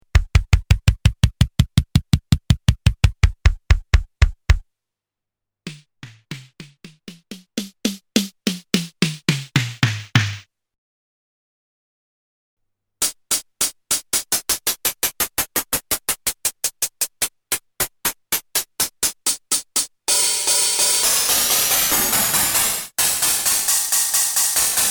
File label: Korg DDD-5 Sounds Tuning